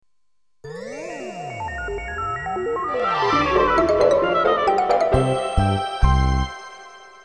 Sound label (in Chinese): 舞曲